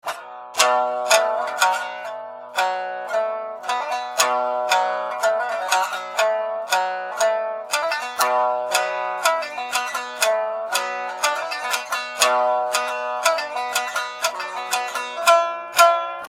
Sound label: Shamisen